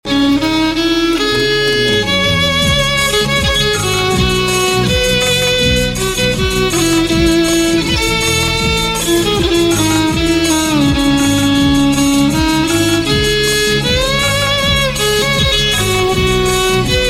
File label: violin; The Great Gambler; Indian